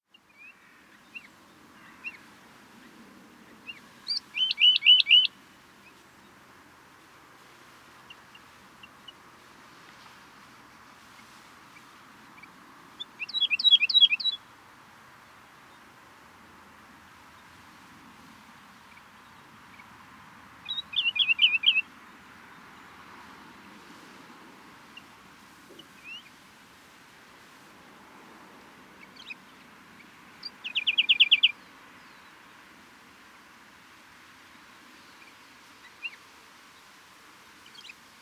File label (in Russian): Ula pozivki; ornitologiya